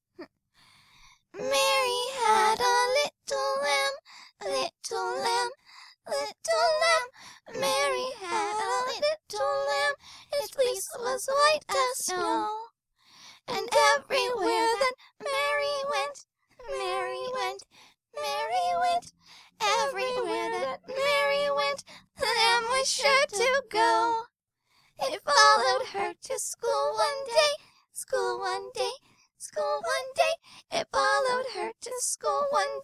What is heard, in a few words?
Mary Had A Little Lamb Childish